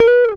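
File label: guitar; Univers Sons; Jazzistic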